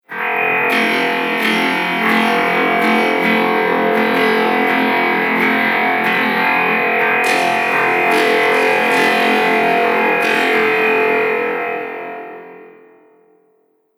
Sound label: Dark Horror Hits; impact; Miscellaneous Impact Sound Effects; Impact / Explosion Sound Effect; Andrew Fleming